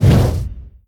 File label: Monster Generic Foley Jump; gamerip